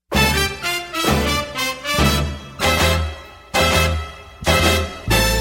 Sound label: SMS ringtone